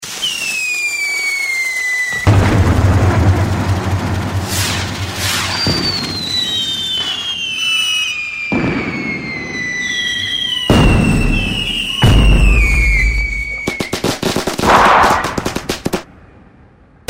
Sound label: fire works